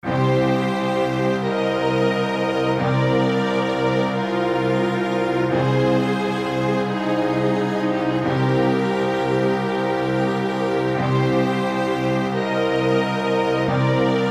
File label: Lose; dragon force